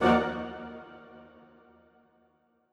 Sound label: Woodwind Ensemble Hit